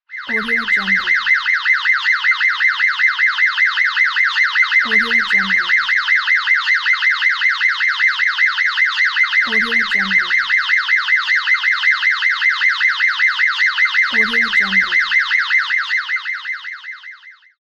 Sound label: siren ambulance